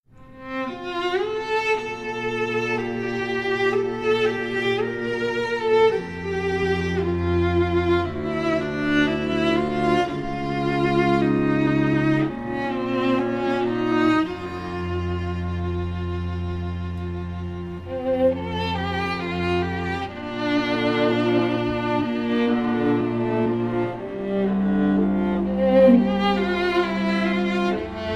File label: String Quartet